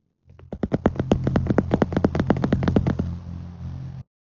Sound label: tip taps, little woodland fox; little woodland fox soundboard